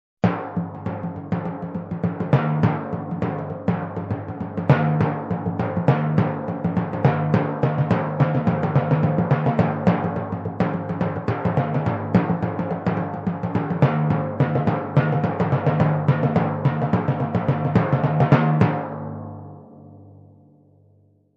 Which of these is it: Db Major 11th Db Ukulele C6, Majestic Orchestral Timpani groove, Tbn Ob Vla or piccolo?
Majestic Orchestral Timpani groove